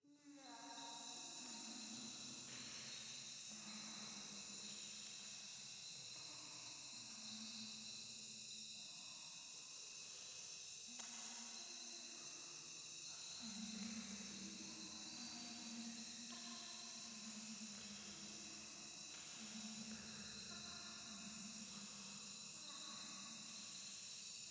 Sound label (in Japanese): フェラチオ 山奥 夜